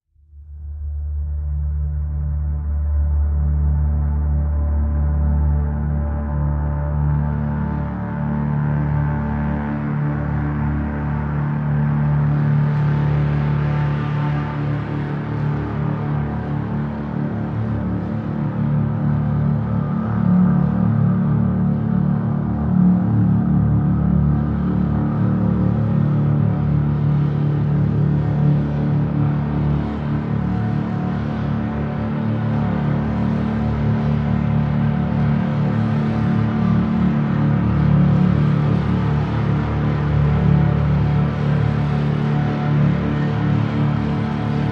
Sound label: Drn Fahrenheit D